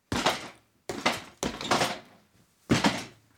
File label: chair punch